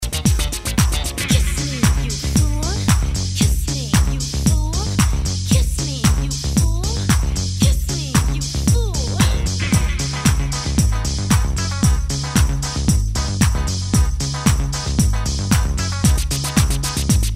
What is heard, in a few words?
Pussycat Meow